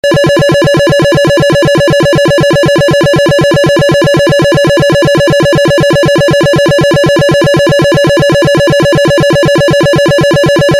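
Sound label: Siren Tone HETRO (RUMBLER); UNITROL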